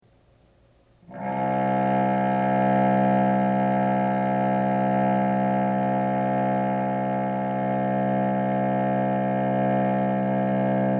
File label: Cello C; tuneguide